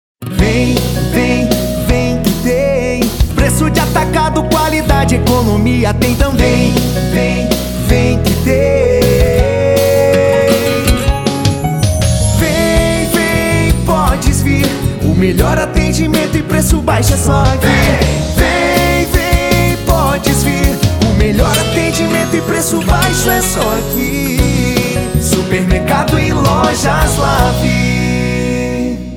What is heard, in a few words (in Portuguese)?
Jingle inedito Supermercado e Lojas; Lavy